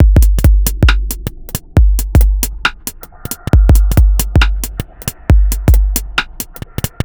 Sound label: Bpm136 Mystic Break, dubstep drum loops